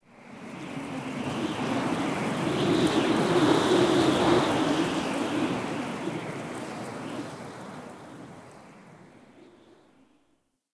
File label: Under City Wind Gust Strong Whistle Rope